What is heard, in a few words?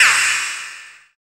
FX Dance